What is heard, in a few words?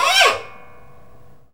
WIND SOUN